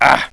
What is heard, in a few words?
male grunt; jcdenton